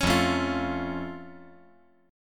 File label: F Augmented F